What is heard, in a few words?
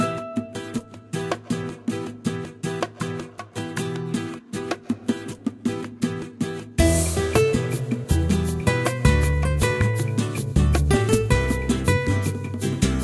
Restaurant; Delicious Emily's True Love; Android iOS macOS Windows